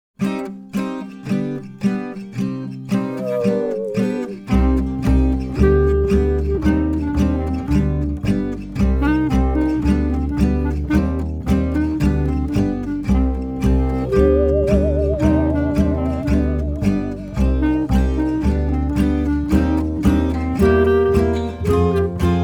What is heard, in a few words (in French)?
La nuit dans la foret version instrumentale